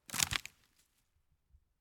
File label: Stone Falls On Wood Mid Field Cracking Branches